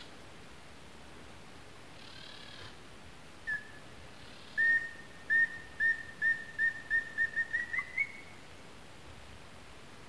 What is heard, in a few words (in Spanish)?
Tororoí nuquicastaño Grallaria nuchalis ruficeps